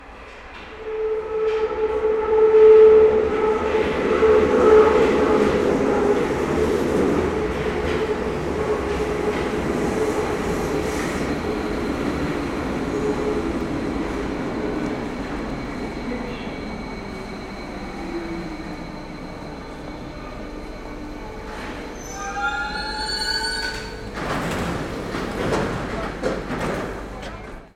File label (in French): Metro arrivee, Paris metro; french